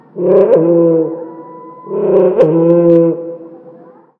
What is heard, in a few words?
Ambience dino